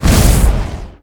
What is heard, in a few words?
skill explo